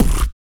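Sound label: vox percussion, Vocal Planet Jazz FX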